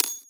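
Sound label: item ring up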